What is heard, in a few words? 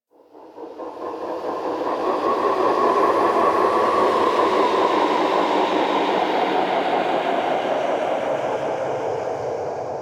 Exterior Phases, Ambience; Warframe; Rail Jack